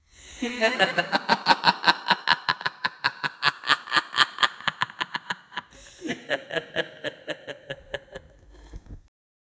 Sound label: laughing fantasy, villain; joker